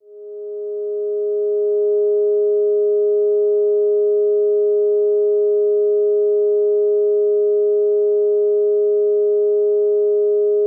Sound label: Concert Ab